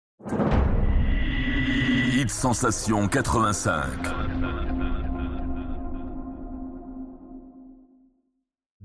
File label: Sweep ID; Hit Sensation 85